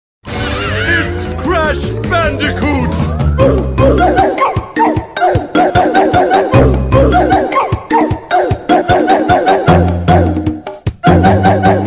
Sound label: oof